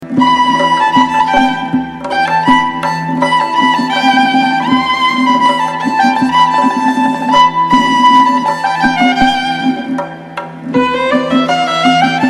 traditional ringtone

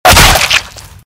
Headshot SFX

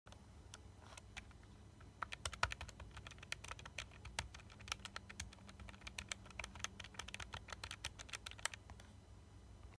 calculator; ماشین حساب

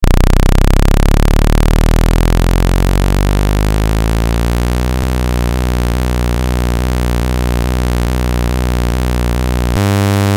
recharge

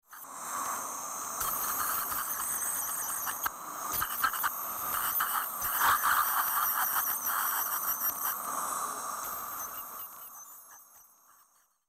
Tooth polishing; پولیش دندان

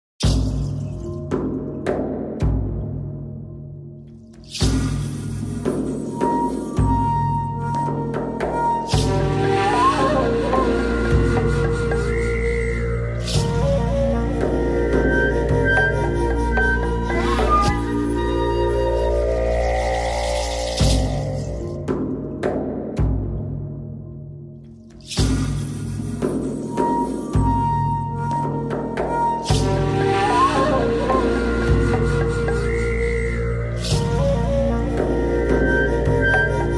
Shaolin Temple, موسیقی مدیتیشن

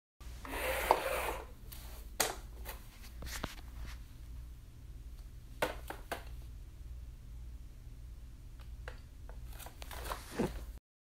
scales

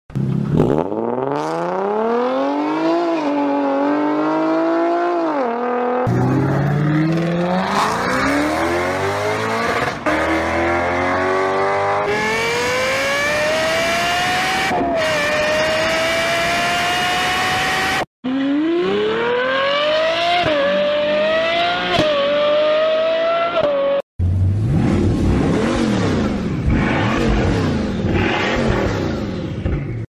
Brilliance, موتور برلیانس

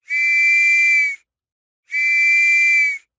police whistle

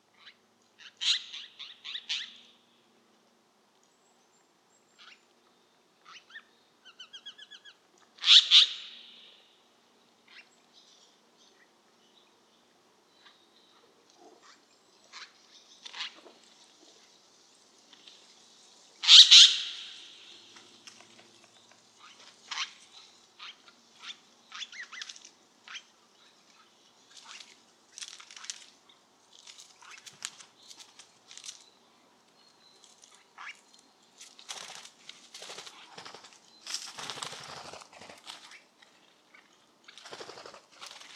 Siberian jay, پرنده جیجاق سیبری

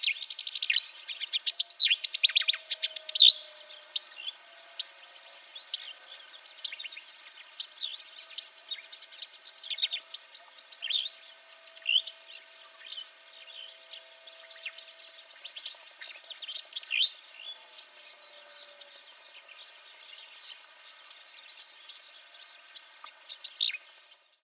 Striated grassbird

